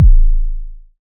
large room bass drum single hit A key, kick drum single hit; kick drum samples